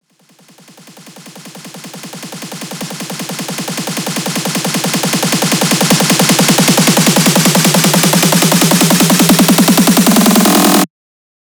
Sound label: buildup loop 155; GhostHack